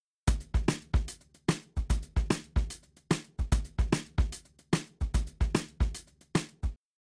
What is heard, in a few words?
Drums, shuffle drums; WDR2